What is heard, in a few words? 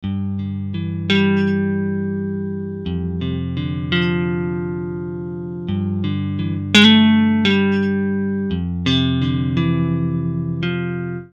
Clean E Guitar